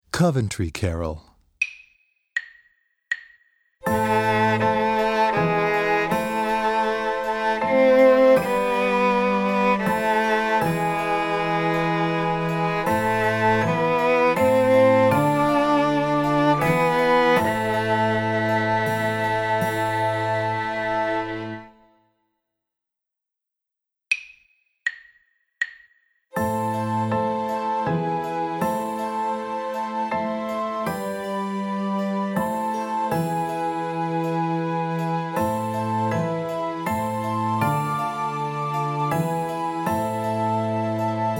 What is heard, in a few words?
Coventry Carol